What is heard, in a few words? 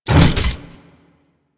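Research Facil Crane Move Vertical End; Venus; Objective Rooms; Props One Offs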